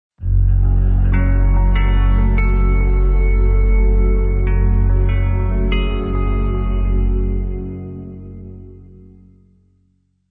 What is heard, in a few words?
Eternal Flame Logo Music Only